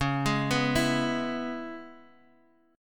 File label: Db Minor 7th Db Guitar Half Step Down